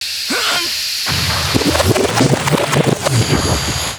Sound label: Kaos FX, Ambient Grooves